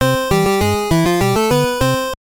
Round Lose Game Over; nuts milk; nes

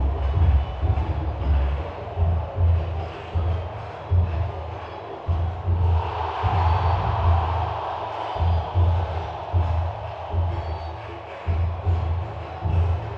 distant atmos; Hollow Knight

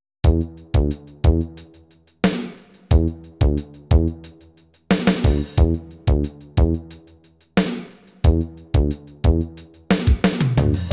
SYNTHE; kenshuui tend dokuta original soundtrack

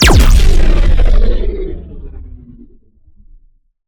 Shot Electron Cannon

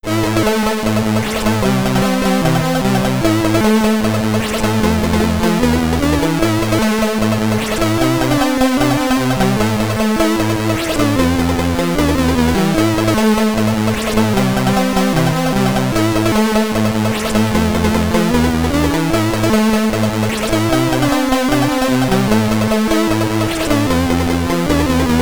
SID Stereo; Super Hang-On; Commodore 64; Dirt Track

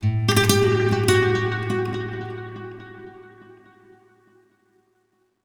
Oneshot Acoustic Guitar; Zombie Army 4 Dead War

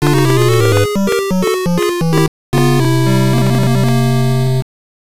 Lose Race; Super Mario Bros. Deluxe; GBC

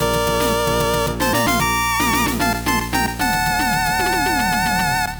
Stereo, Stage Clear; Journey to Silius; nes

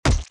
axe body

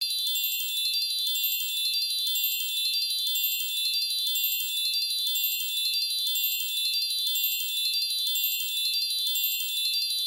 log in bonus bgm

